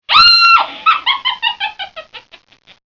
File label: Witch Cackle